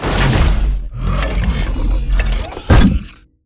bt embark casual front; Titanfall 2

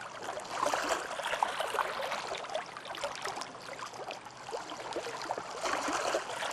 amb dock water; angel city; Titanfall 2

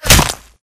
imp bullet lightballistic headshot human; Titanfall 2

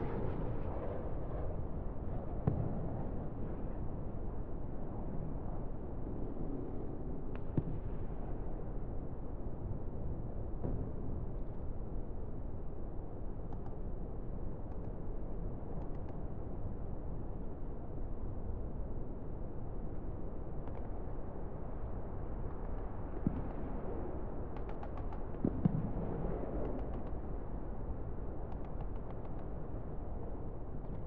amb training ground int small room; Titanfall 2

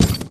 Sentry Turret Footstep Generic; Boost Card; Titanfall 2

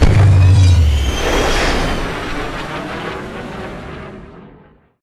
crow fly away; classic mp intro; Titanfall 2

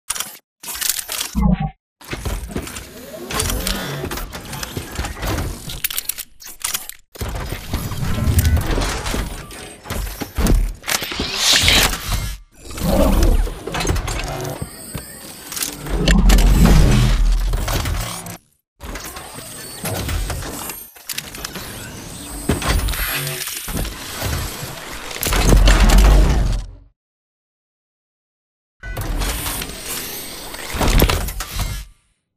Combat Idle; Titanfall 2

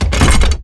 Metal Jog; Titanfall 2

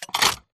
dmr reload mag insert; Titanfall 2

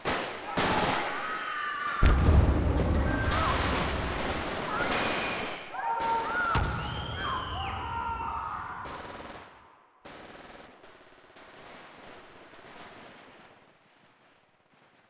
slaughter screams; colony; imc intro; Titanfall 2

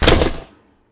Fence Flip Stop; Titanfall 2; Boomtown

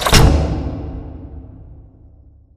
cooling panel stop; Titanfall 2

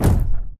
Footstep Jog Mid; Titanfall 2

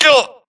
grunt hits; Titanfall 2

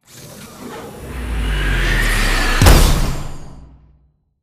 embark casual left end; Titanfall 2

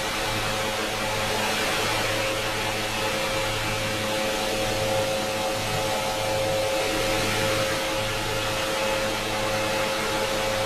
Assembly Conveyor Move Close; Boomtown; Titanfall 2

